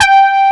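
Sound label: horn G3, synthesizer analog horn; AKAI S6000 CD-ROM - Volume 1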